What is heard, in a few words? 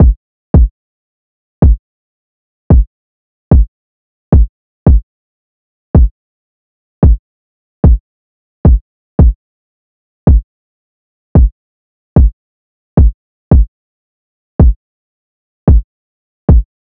Drum Loop 111 BPM Kick, RnB; Loop Stems; Cymatics; GHOST (Beta Pack); Forever Drum Loop